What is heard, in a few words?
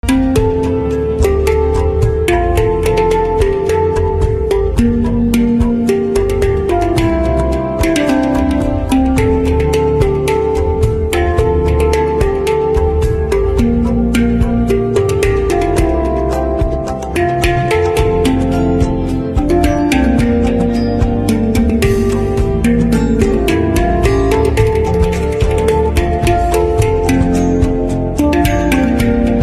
Handpan Instrumental Ringtone